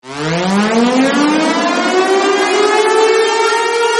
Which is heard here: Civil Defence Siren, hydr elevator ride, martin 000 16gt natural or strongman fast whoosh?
Civil Defence Siren